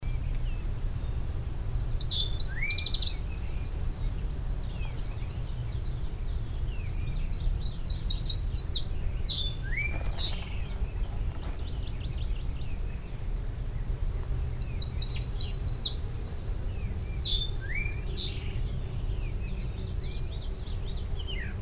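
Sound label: Yellow Warbler, charles, Maryland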